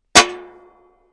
Frying Pan Or Pot Clan; pots & pans

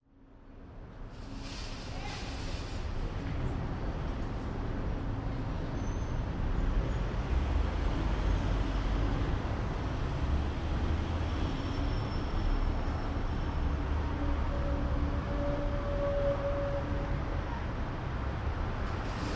City Skyline Busy Night; big city

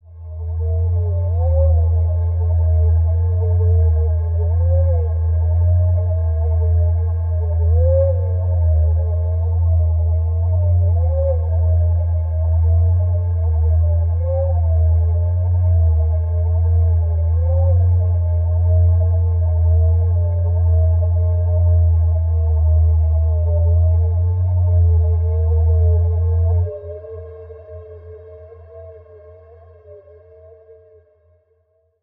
Repeat Thought Stdy Rep, sci-fi ambience

